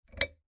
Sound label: switch lamp; Zomboid; tsarslib; commonlibrary